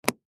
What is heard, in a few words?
Latch Clasp Snap Close; Latches; Blastwave; Foley